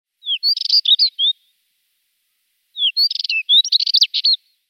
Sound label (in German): Brillengrasmuecke Sylvia conspicillata; Vogelstimmen